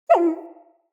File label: Wolf Cub Trapped Call Close; Vocals; Animals